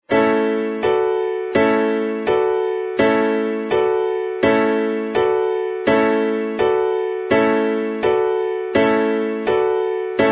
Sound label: Wolcum Piano